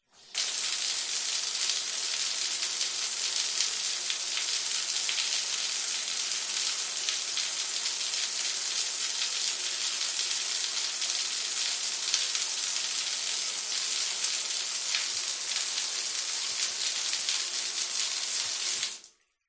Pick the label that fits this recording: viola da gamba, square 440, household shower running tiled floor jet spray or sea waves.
household shower running tiled floor jet spray